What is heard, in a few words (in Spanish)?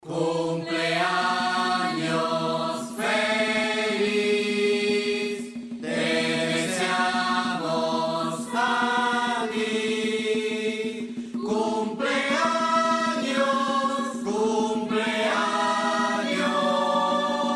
FELIZ CUMPLEAÑOS